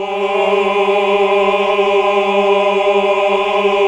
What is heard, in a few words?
"aah"